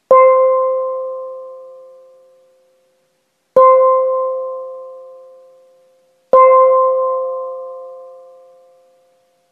Buckelgong klein

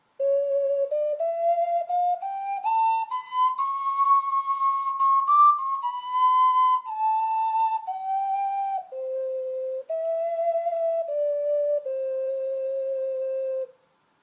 Konzertocarina

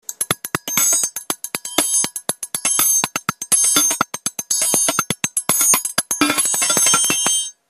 Grosse Triangel

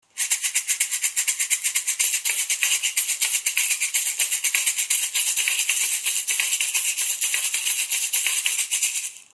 Kurbis; Thelevi

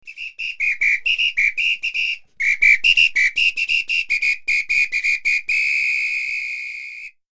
Sambapfeife

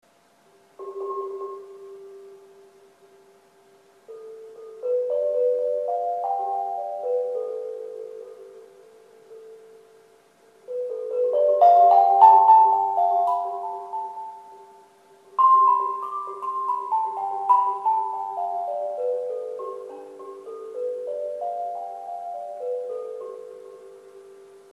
Lithophon

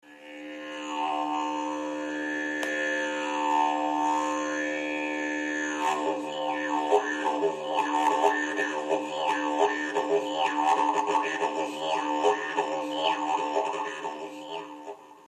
Schneckendidge d2

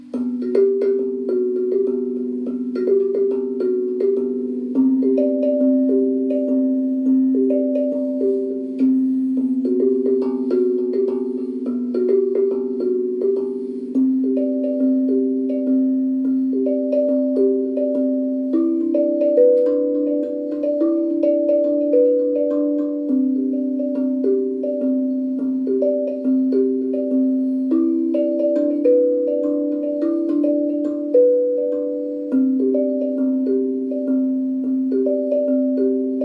Zenko Equinox Impro Schlägel

